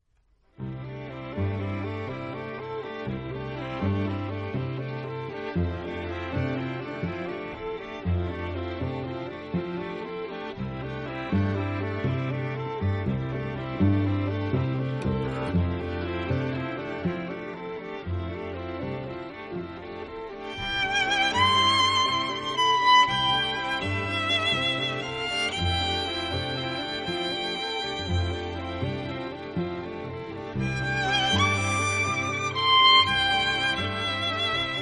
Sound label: violin concerto excerpt